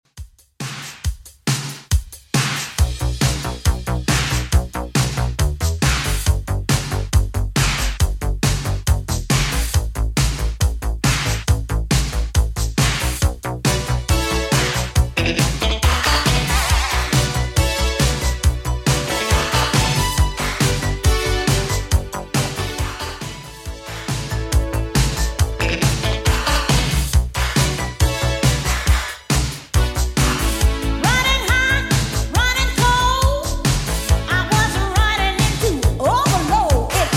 80s Redrum, 138 bpm

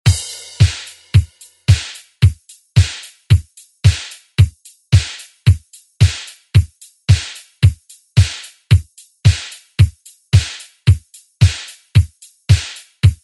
80s ReDrum; DJ Mhark

